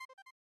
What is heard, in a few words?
Select Fanfare; Run Saber; SNES